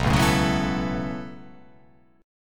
B 7th Suspended 2nd Suspended 4th down, chord

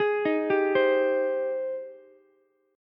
Ab Major 7th Ab, Ukulele; AbM7; 13,15,15,15; arpeggiated; chordshape; JGuitar

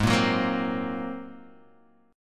A sharp 7th Suspended 2nd Sharp 5th

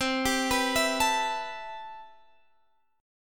C Major 13th C

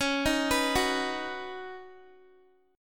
Db 7th Suspended 2nd Suspended 4th, Guitar; x,16,15,16,18,x; Guitar-4F,4A,5C,5G,5C,6E; Db7sus2sus4 arpeggiated

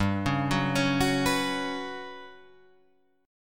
G Major 7th Flat 5th G down Guitar Drop D

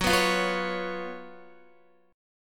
Gb Major 7th Suspended 4th Gb Guitar; GbM7sus4; strummed; x,9,11,10,11,9; JGuitar

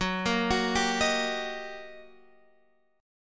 G Minor Major 7th Sharp 5th, F sharp; GmM7#5/F# arpeggiated; JGuitar

